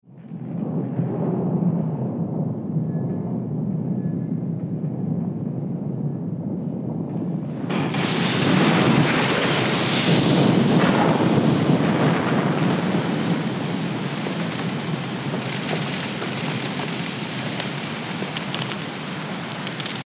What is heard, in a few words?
Earth pressure